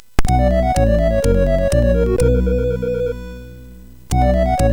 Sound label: Jingles, MOS6581; MOS6581R4; hvsc